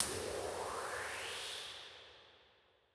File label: Textures Church Hit; Igny; Impulse Responses; Ableton Live 12 Lite; Hybrid